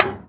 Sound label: Monster Can, percussion; Houston; Moody Park